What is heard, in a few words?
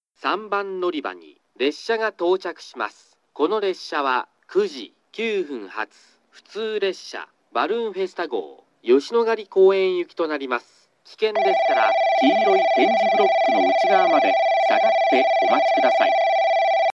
balloon